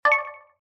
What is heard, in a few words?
btn click; 1casino-plinko-x